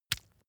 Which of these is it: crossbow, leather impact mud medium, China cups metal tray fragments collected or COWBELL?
leather impact mud medium